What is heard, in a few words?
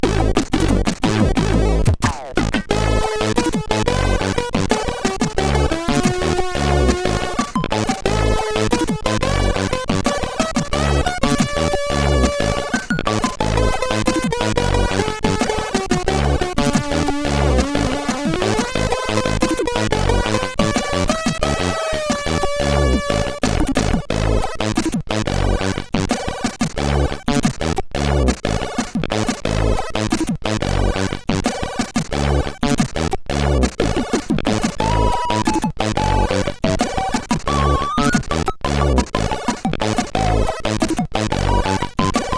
Pod Jams, sid CSG8580R5